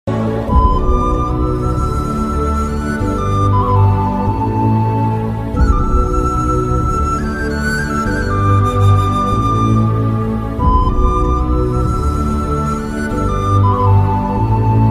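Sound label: emotional scene bgm music; aravinda sametha movie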